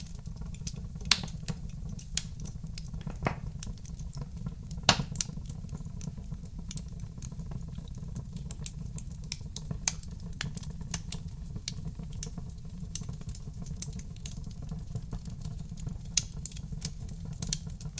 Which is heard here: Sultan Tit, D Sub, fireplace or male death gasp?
fireplace